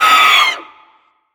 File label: Raptor alerted